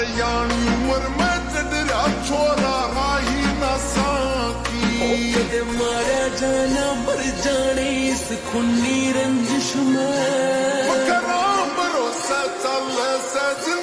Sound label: Ringtone Slowed Reverb